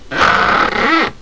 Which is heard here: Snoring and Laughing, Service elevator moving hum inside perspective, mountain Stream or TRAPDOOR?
TRAPDOOR